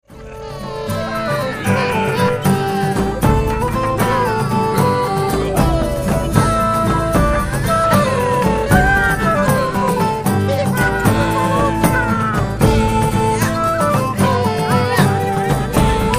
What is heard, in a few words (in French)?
flutes tambourin bodhran